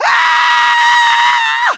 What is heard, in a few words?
scream